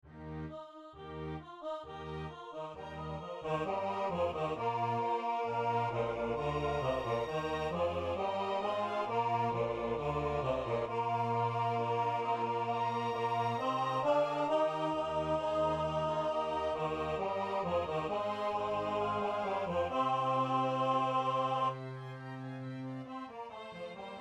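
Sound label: Sound Bass; Messiah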